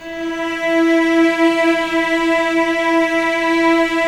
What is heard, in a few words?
E3 LEG MF, VCS LEG MF E, The Ultimate String Collection